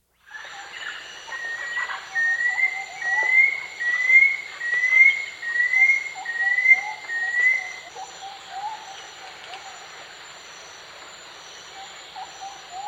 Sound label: Uniform Crake; S. America